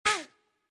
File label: Pain hit girl